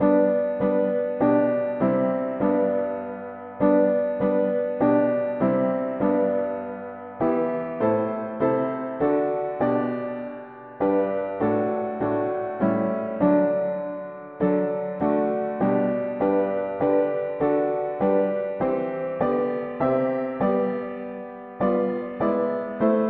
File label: mono piano; Ye servants of God your Master proclaim; hymns